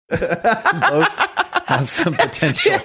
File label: having a good laugh